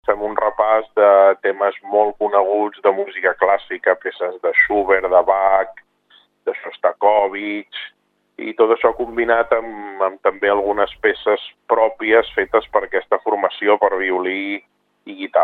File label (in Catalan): guitarra; nova radio lloret